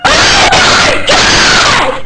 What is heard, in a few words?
baby rocket; garrysmod